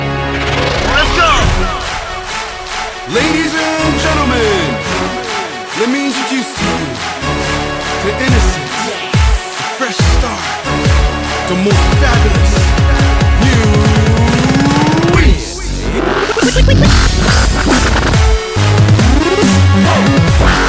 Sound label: dubstep